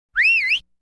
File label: short whistle